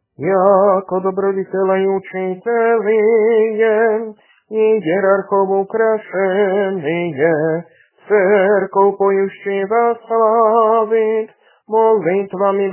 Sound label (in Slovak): Kondák svätiteľom, hlas 8; nedelna-skola